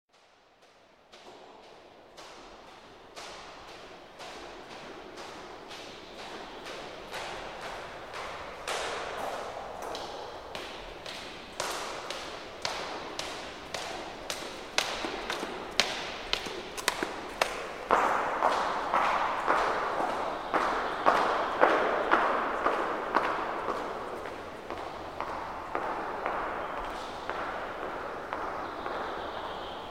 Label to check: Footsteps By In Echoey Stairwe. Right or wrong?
Right